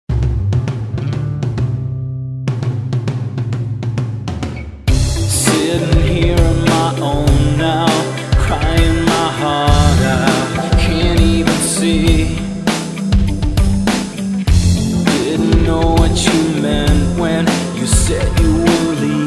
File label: rockpop; Sound PooL